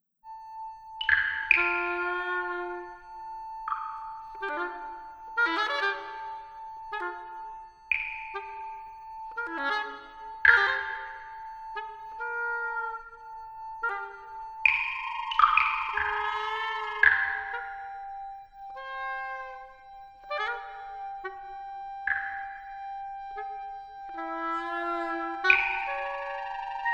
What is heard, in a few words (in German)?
oboe schlagzeug und elektronik; kilian schwoon; kerbholz